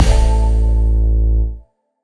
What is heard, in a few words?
Retry Jingle